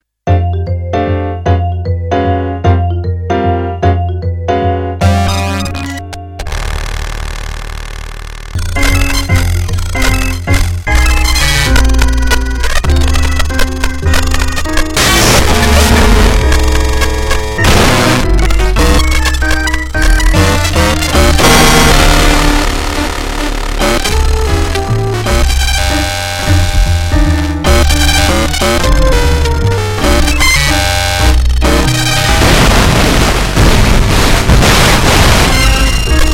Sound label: Circuit Bent Casio CTK-550